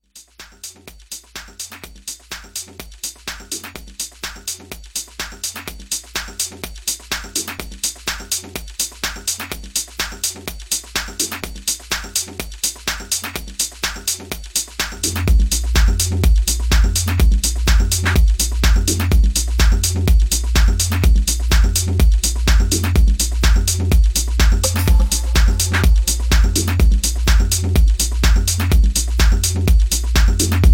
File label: techno mix